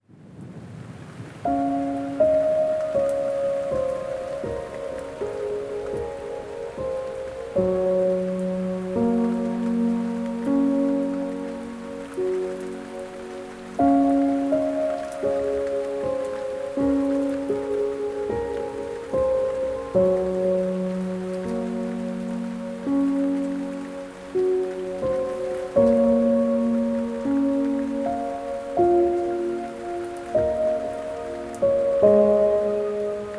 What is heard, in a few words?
piano, sleep in peace and rain